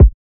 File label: kick; FL Studio; xander brown drumkit